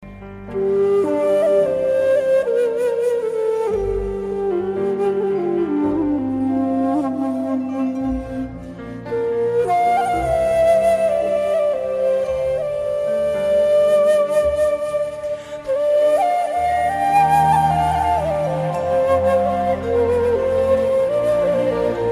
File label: Sad Song Flute Ringtone